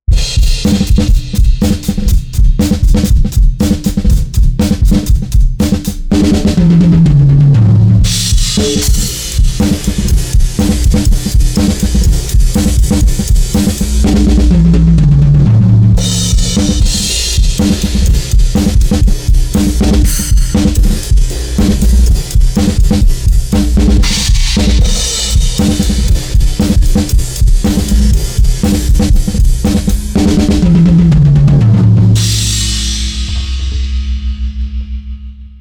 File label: LOW BOOST ANALOG off; Lindell X 6X-500